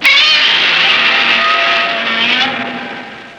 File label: alarm